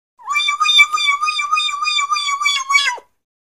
Macaw Calls Several R

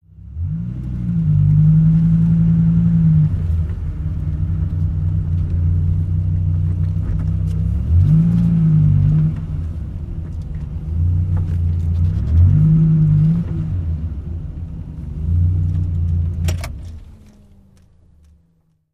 Accelerate Reverse, sports car

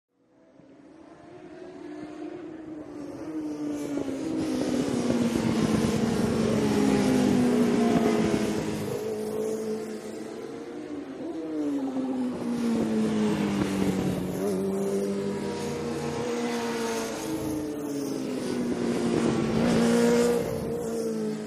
Motorcycle Road Racing

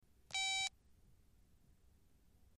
Telephone; Cell Phone Connect Key Beep.

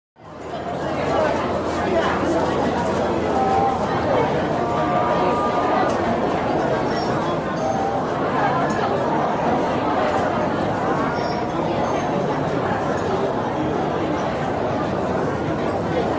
Large Chinese Restau

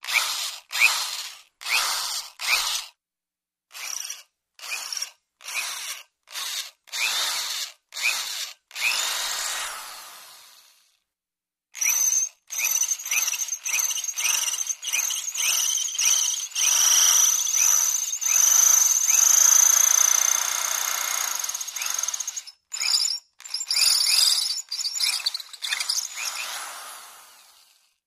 Drills Electric Drill

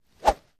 Golf Club Swing

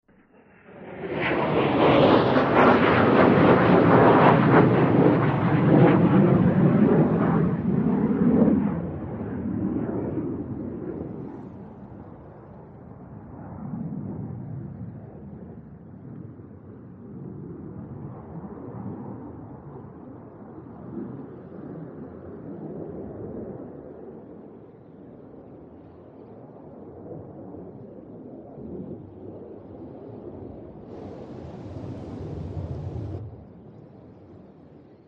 A3 Skywarriors Take

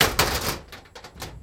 Cabinet Metal Big Em

